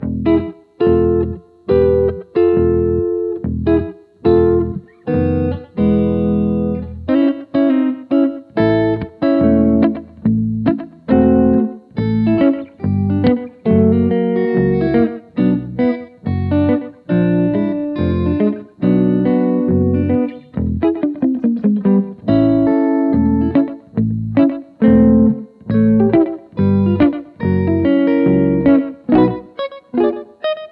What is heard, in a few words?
Bass Guitar Style